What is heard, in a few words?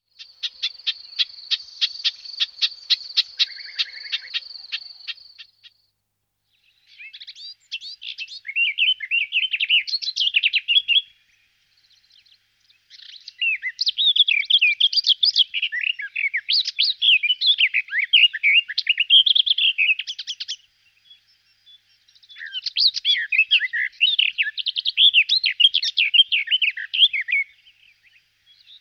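sadovaya slavka golos, garden warbler voice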